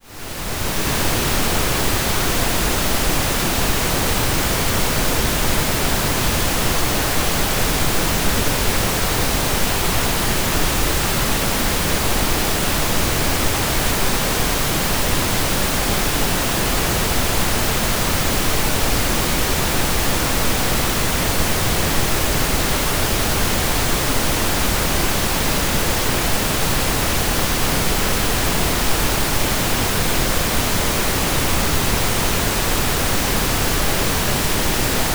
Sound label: Uncorrelated Pink Noise; subwoofer